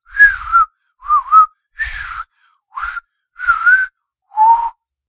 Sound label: Man trying to whistle